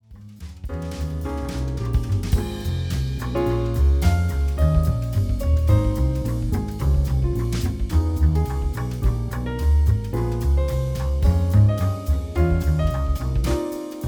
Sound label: jazz trio